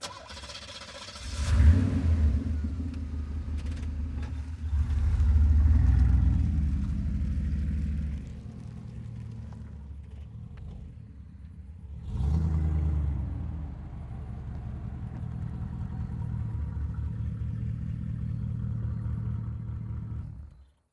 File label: 1982 Z28 Reverse Up